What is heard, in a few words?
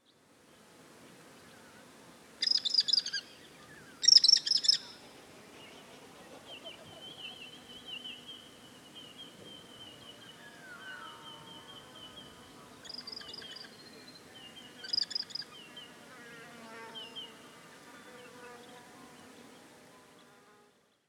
Falco longipennis, Australische Boomvalk; roofvogels; Falconidae; Falconae